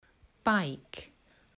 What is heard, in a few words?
bike, fun with sounds